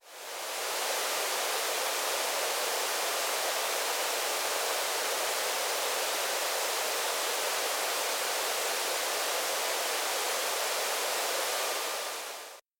Bruit de fond; Background Noise

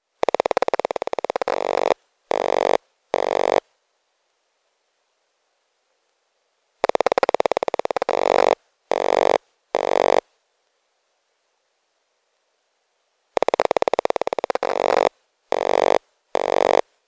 WIFI ipod recherche reseau wifi; Ondes électromagnétiques et électromagnétisme

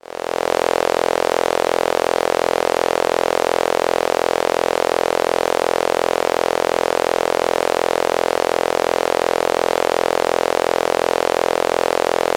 Son d'un compteur détectant un signal analogique pur; compteur Gigahertz Solutions; Ondes électromagnétiques et électromagnétisme

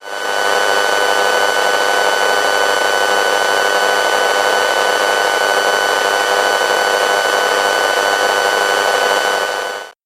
Antenne relais telephonie mobile 3G; Ondes électromagnétiques et électromagnétisme

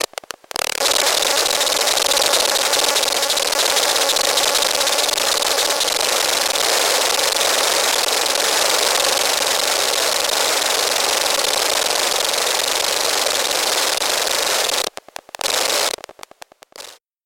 WIFI diffusion en continu sur ipad; Ondes électromagnétiques et électromagnétisme

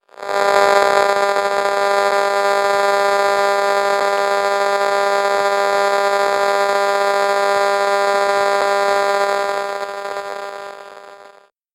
Jeux video NINTENDO WII Transmission entre une console de jeu video et une manette sans; Transmission entre une console de jeu video et une manette sans fil; Ondes électromagnétiques et électromagnétisme

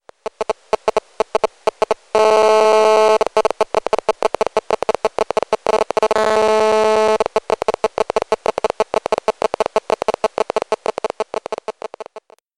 telephone portable GSM; Ondes électromagnétiques et électromagnétisme

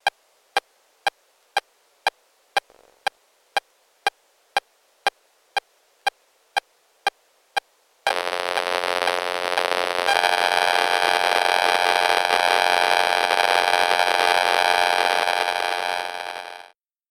Appairage clavier ordinateur Bluetooth 2.4 GHz; Ondes électromagnétiques et électromagnétisme

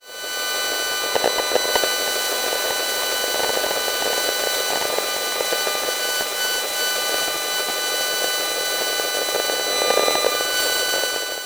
Antenne relais telephonie mobile echantillon; Antenne relais téléphonie mobile - échantillon 5; Ondes électromagnétiques et électromagnétisme

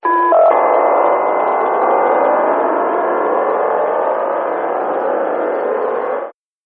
Transmetteur HAARP; Ondes électromagnétiques et électromagnétisme

